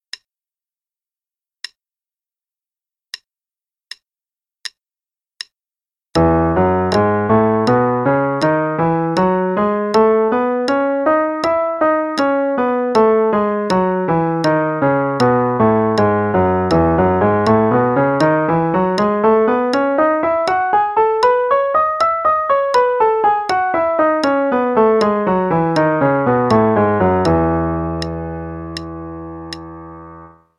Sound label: E Major Scale only (qn=80); 8th notes = 2 octaves, and triplets = 3 octaves; CM Level 5